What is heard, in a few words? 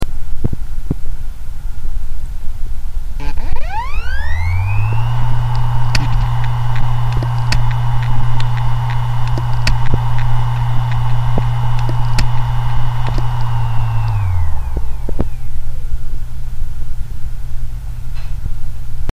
Samsung dead commutatur; Почему у специалиста по восстановлению данных должен быть хороший слух?